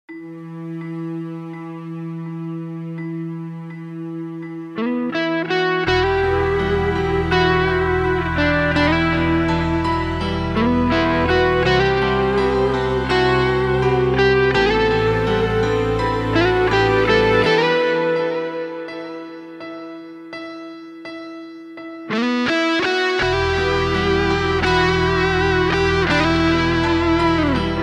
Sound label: Instrumental